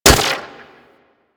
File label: Speeder Rifle Fire